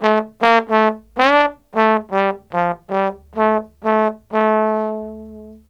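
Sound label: TROMBONE SOLO, BRASS; BRASS KIT; Ragga & Dub Reggae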